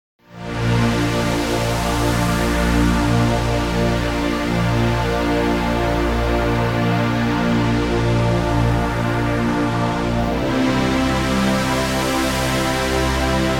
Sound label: Lush pad synth sound